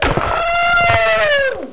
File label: SCRMDIE; THEXDER